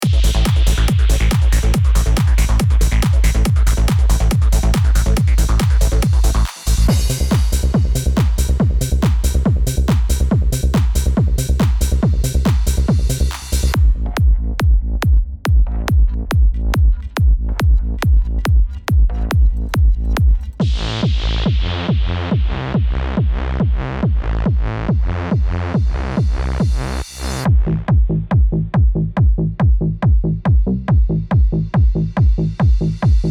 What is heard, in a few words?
Zebra 2 Trance Bass; Zebra2 ZebraHZ; soundbank; trance music; 32 x presets